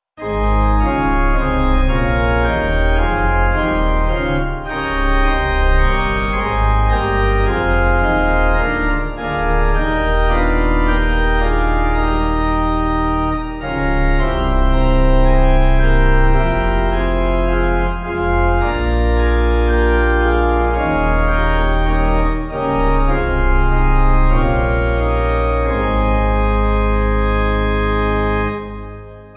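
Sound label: Pipe organ, church music backing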